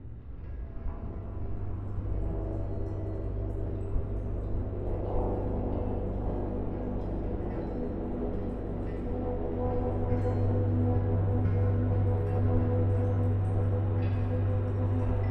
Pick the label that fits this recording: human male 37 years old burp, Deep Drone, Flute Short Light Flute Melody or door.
Deep Drone